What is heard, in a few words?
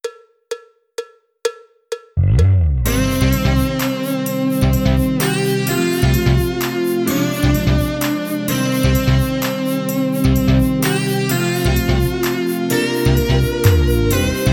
Mellow Fusion drumless